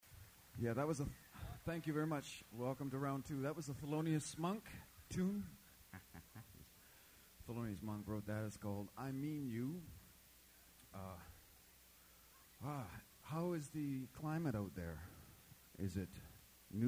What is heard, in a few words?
Jazz; Jazz n The Park; June 7 1978